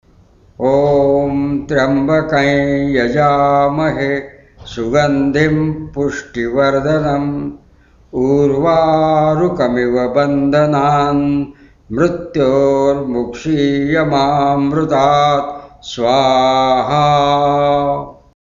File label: Om Tryambakam